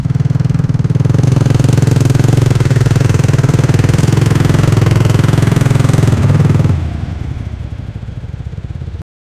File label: motor cycle honda cb 650